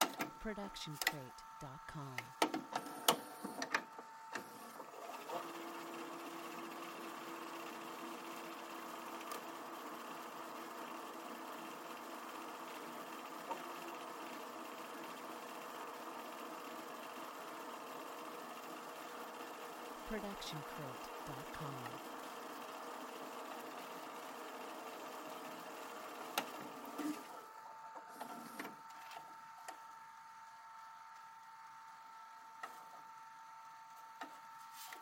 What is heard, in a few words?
VHS start forward stop